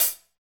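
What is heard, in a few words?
hi-hat, hi-hat real; Sound & Vision - Gigapack I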